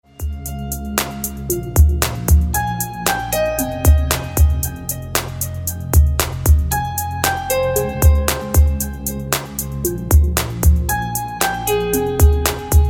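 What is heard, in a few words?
beautiful and melodic ringtone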